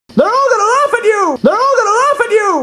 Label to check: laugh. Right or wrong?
Right